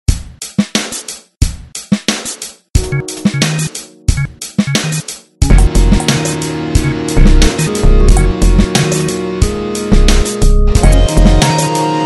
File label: funk